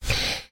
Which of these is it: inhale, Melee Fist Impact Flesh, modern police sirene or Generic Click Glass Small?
inhale